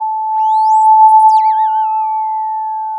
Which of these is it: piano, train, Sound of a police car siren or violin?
Sound of a police car siren